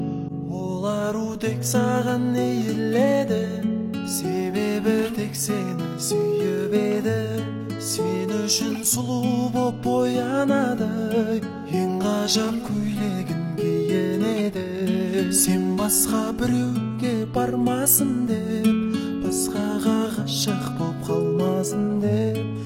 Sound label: Acoustic